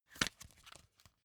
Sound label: magazine flip back fast